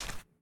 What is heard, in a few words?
step